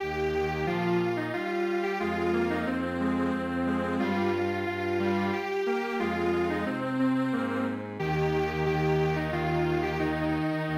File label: Strings Quartet; gayaeum ta ryeong